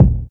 kick, Classic Hip Hop

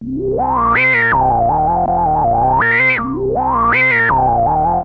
SH101

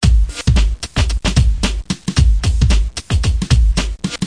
112 bpm funky ultraswing, drum loops and breaks

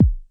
909 bass, drum machines

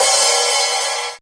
rs7000 hh cy f4, Yamaha RS7000 Cymbals; drum machines

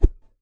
kung fu staff, swings

